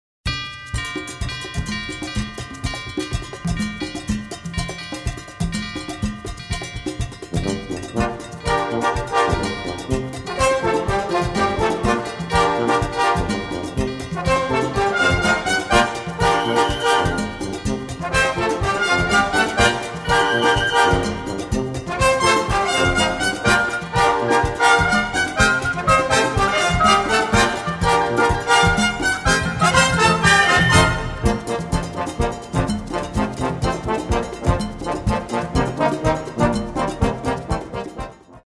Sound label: Weddingbells